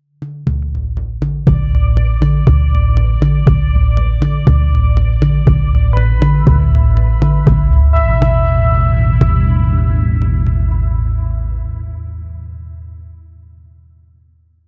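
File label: rhythmic slightly mysterious, oud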